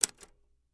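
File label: Typewriter Single Key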